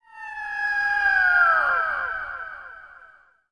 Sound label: Eagle Screech Echo